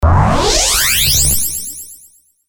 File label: sfx up